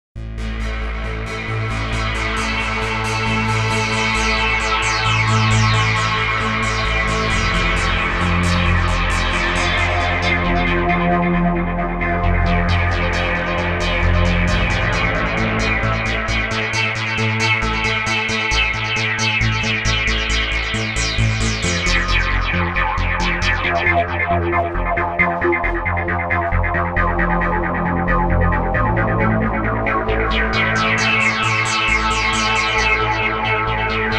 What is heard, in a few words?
arpeggio delay; Midi Routing to internal and external devices with virtual MIDI over S/PDIF